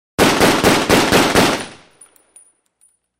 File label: gun